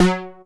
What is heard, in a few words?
arpeggio; Masterbits; Vintage Voltage; SEQ-FX